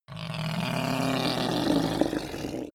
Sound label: reptile dying choke